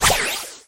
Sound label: dryfire sfx; angelo